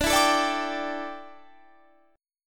D Suspended 2nd Suspended 4th D, down, Guitar Standard; strummed